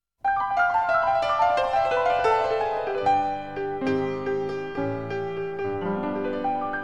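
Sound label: cheerful piano sound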